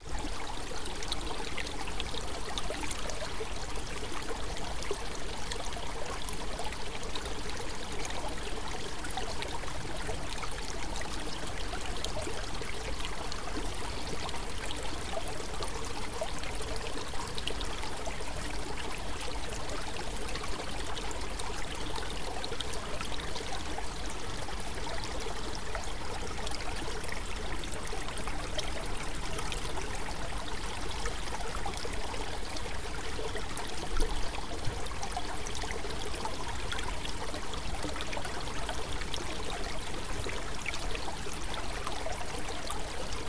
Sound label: Babbling Brook